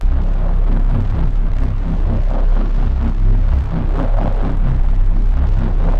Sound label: Dark Loop